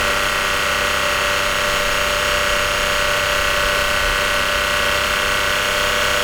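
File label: Dacia Sandero II, Onboard, Engine, 4k RPM loop; 1.0 SCe Engine; Soundholder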